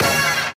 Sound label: super Meter Bet Level; mega joker